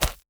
walk ground; warrior

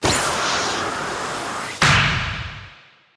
blast

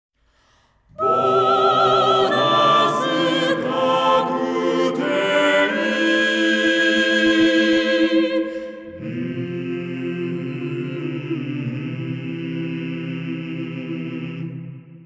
Comical Skeleton Battle Victory Jingle; Pachislot Akumajo Dracula III

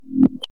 Evil spikes

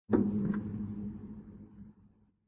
Tv Turn On

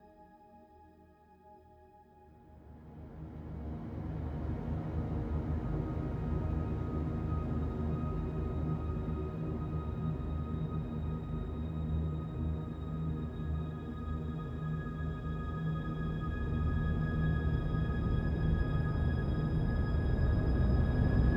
Default Loading Music

